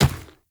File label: Infested Medium Run Dirt, Footsteps